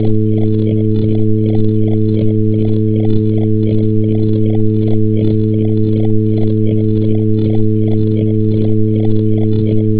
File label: Gas-Turbine ship